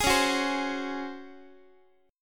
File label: Db Major 7th Suspended 4th Db Guitar; DbM7sus4; strummed; tuning=4A,4E,5A,5D,5G#,6C#; x,x,11,11,10,11